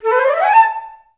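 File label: flute run up A3; Best Service; Peter Siedlaczek's Advanced Orchestra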